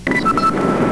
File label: doorbell